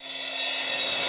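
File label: SEQUENTIAL CRASH REVERSE; Hollywood Edge; The Big Bad Beatmachine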